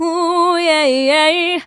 "ooh yeah yeah" SUNG

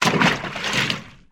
splash

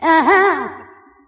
"uh huh", sung; ooh ahh; uh um

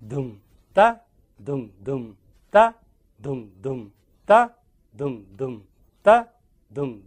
doom dah doom doom dah, Beatbox; Drum loops

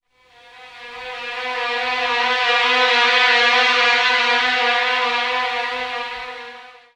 strings western, Sad Loops

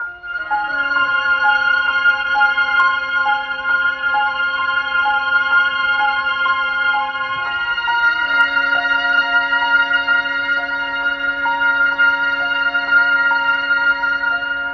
strings and piano, sad; loops

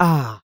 ah

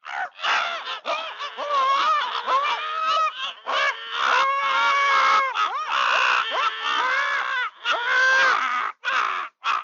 chickens mad; Animals

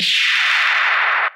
piss; Zaps and Beeps

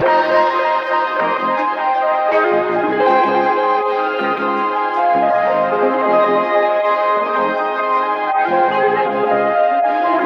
guitar and keys; loops; Phonky

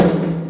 Snares, Real

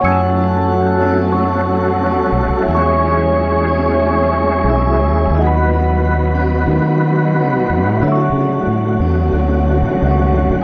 keys, jazz loops

